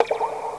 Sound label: Mellow; Querkz 1.5